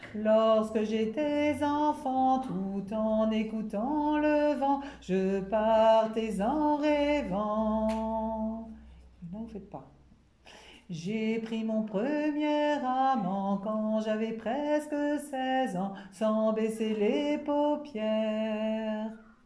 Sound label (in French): couplet basses; Groupe Vocal